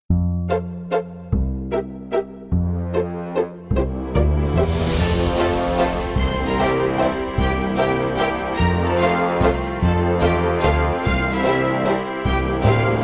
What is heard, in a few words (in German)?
Werbemusik Playback